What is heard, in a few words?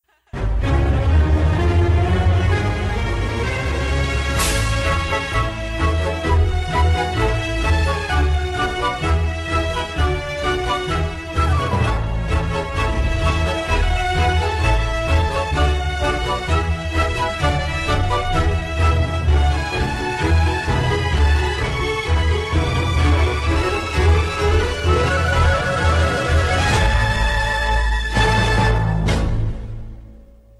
ringtone; little einsteins